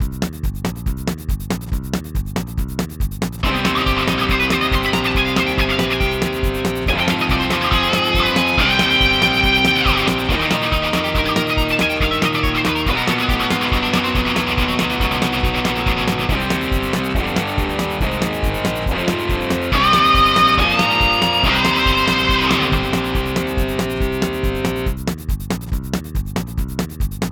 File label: Guitar Solo; Motherless